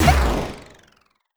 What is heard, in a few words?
cartoon blow impact